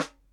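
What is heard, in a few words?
snare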